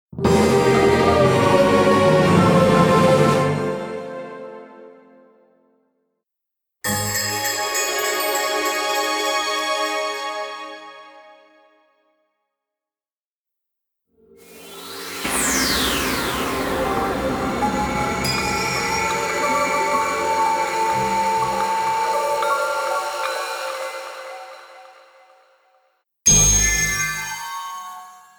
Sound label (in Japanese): ジングル